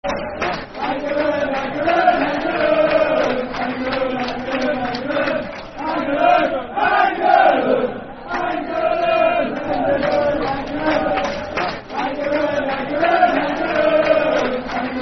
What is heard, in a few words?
Hangeland, Football Chant; Fulham Ffc